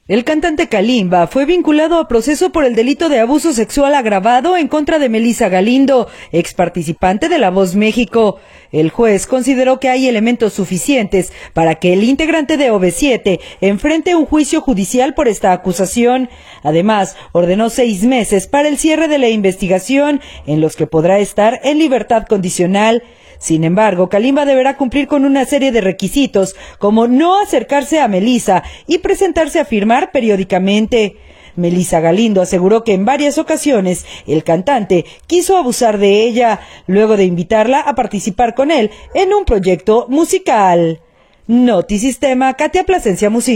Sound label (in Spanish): Kalimba